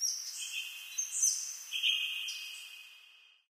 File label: amb african birds; video games; ambient; nature; source engine; portal2